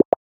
メッセージ

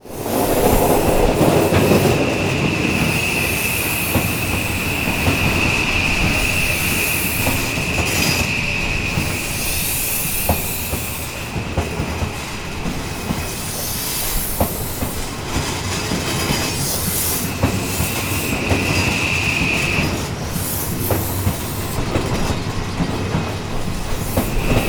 電車の音; 環境音

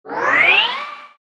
能力向上

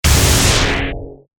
闇魔法 中 モノラル; 魔法効果音

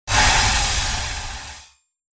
エイリアンの鳴き声

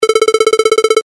会話

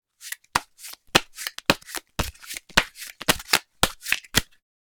カードシャッフル; board game casino mahjong

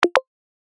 メッセージ

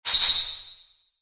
ランク レア度2

